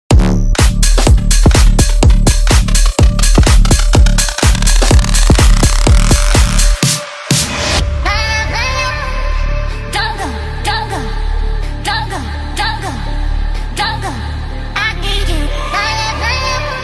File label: electro